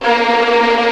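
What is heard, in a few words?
Vio tremolo A#2; Digital Sound Factory Virtuoso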